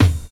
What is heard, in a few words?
high tom single hit f key; tom drum samples